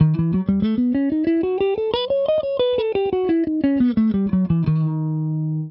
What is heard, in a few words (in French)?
Re Diese Super locrien, Mineur melodique, Guitare; Ré# altéré (Super Locrien); Exemple audio " Ré# altéré (Super Locrien) "